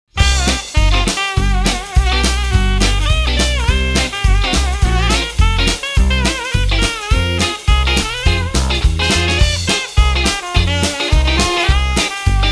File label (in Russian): saksofon